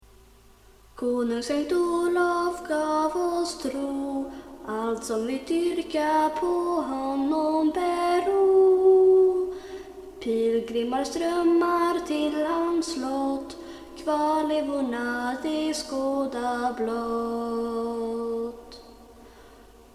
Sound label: olsmass pitch